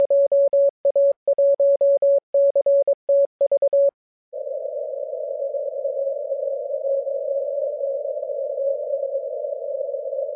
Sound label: 14MHz